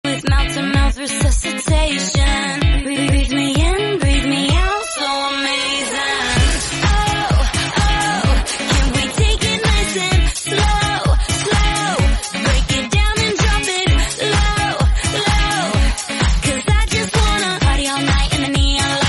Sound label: english song